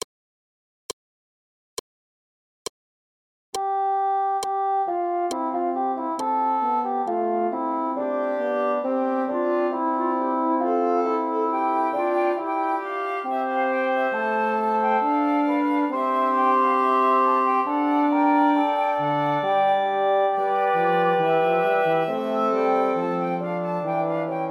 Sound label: Canzona per Sonare, recorder; Gabrieli